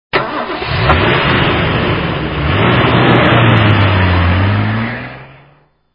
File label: Car Leave